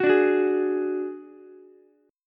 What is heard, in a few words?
Gb Suspended 2nd Sharp 5th, Ukulele; Gbsus2#5 strummed